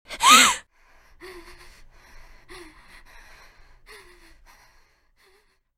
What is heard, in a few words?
Teenage Girl Gasps; fractured minds